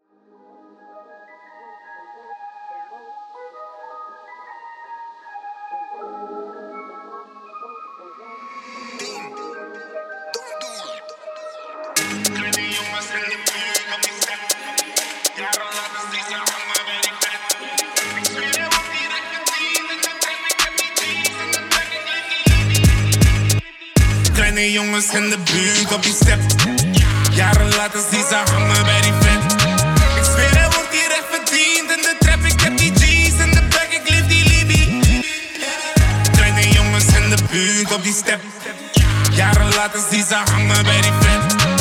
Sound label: Trap